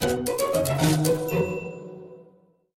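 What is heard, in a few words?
Iphone typewriters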